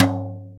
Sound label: R8 TOM